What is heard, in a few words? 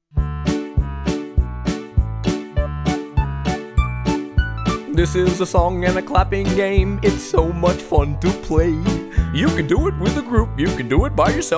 Tanets so shlyapami